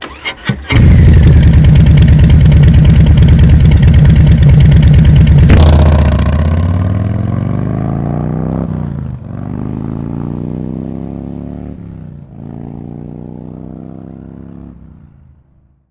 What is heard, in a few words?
Softail 107 3.5 Slip-On Take Off, slotted slip-on mufflers for harley; rinehart-3-12-slotted-slip-on-mufflers-for-harley-softail-2018